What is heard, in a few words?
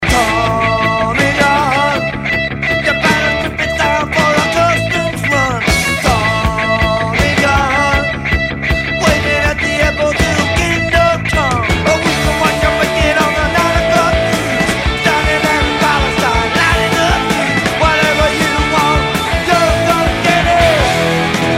ring, ringtones